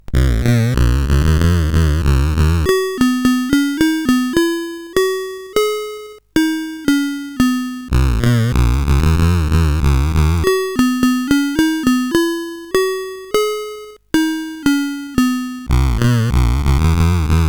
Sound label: sid, CSG8580R5; Soon Coming tune 1